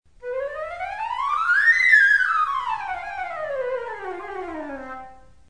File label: flute